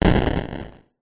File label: retro game classic explosion 8 bit arcade 80s weapon attack